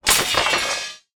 impact metal wood glass drop on ground

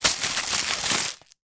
household tin foil sheet scrunch up; sound effects one

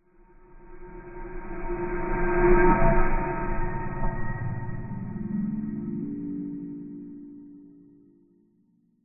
sound design swell weird futuristic dark dull; zapsplat

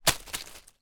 stick branch fall on forest floor; foley

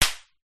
leisure toy gun cap gun fire

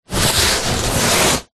sport exercise mat foam thin rolled up handling

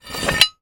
metal rusty heavy hinge movement squeak; foley; zapsplat

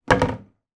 clock grandfather door close

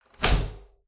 Door Bathroom Unlock

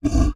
animal gorilla grunt or vocalisation

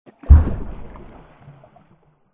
underwater splash drop heavy rock; silverplatter audio

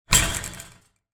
household gate metal hit wobble

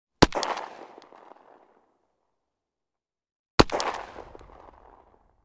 gun model 1894; still north media

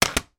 household cd case put down on table; sound effects one

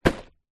food candy inside card box down on surface; zapsplat

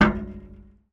metal impact gate hard vibrate

